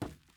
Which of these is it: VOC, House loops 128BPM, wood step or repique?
wood step